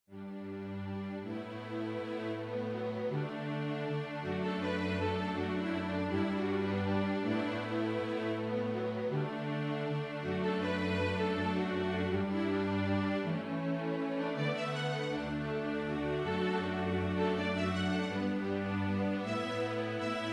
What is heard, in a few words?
String quartet